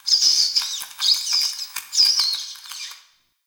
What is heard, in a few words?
Town Street Critter, Ambience; Warframe; Duviri